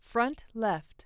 Front left; SBLive; Creative

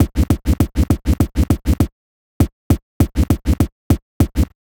Vinyl Scratch Kick; Digidesign; Pro Tools; Pro Tools Essential Loops; Dance DJ

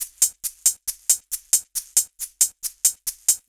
137 Dance Shaker Hats; Dance DJ; Percussion; Shakers; Pro Tools Essential Loops; Digidesign

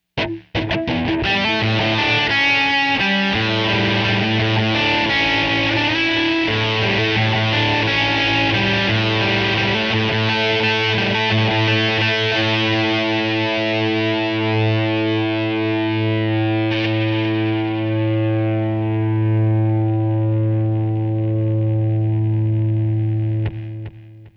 dirty gtr; Pro Tools Essential Demos; Digidesign

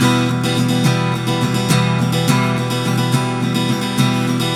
Pop Ac-Guitar Strum Emin; 105; Pop Rock; Acoustic Guitar; Pro Tools Essential Loops; Digidesign

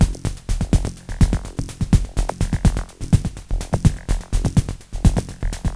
125 Dance Perc; Percussion; Pro Tools Essential Loops; Digidesign

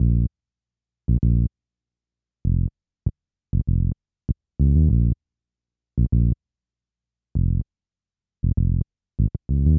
HipHop Synthbass A#Min; Digidesign; Pro Tools Essential Loops

